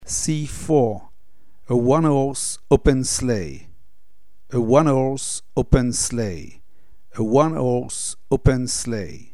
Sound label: a one horse open sleigh